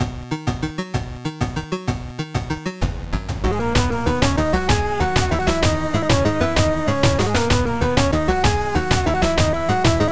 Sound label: Tavern Theme Extended; Tiny Rogues